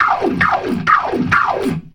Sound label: GLISS, ORGAN RIFFS; InVision Interactive; Keith Emerson